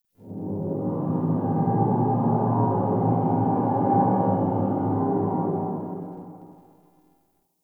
Exterior Distant Calls Angry, Ambience

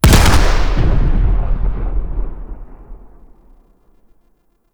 Disruptor Grenade Blast; Warframe

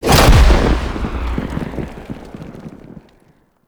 Interior Orbital Station Pod Eject; Warframe; Tau

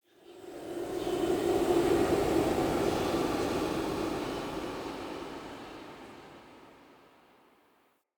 Secret Uncovered Whisper Long; Puzzles

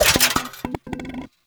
Vending Machine Bottles; Ambience; Props; Warframe; Nineteen Ninety Nine

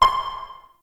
Interior Orb Station Battle Map Ping Close; Warframe; Tau; Orbital Station

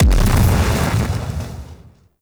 Explosion Large; Warframe; Weapons; Thanotech

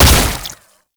Underground Attack Tentacle Slam; Enemies; Abilities; Warframe; Proto Infested Babau

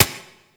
Turret Low Clip; Lasrian APC Turret; Weapons

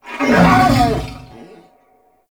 Wolf Pain; Animals; Duviri

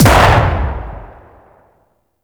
Quad Sniper Shot; Warframe; Tenno

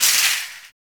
Shopping Cart Hit

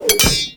Grapple Impact; Valkyr Rework; Warframe; Berserker

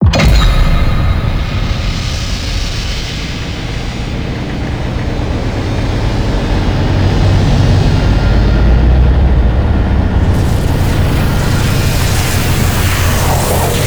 Platform Activation; Cinematics; Warframe; TheNewWar; Throne Room

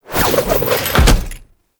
Teleport End; Enemies; Abilities; Warframe; Tau; Dax Ninja

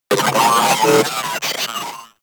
Vocal Attack; Moa; Vocal Set D

